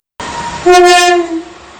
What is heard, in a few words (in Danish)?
IC4 MG tyfon